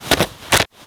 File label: Rifle Pickup Wall; Warframe; Pickups